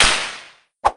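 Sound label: suspend slap and fling; mamemomi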